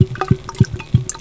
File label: Liquid Pour; SFX Loop; XRI Examples; Grab Interactables